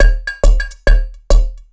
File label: percussion loops, Techno; PS-45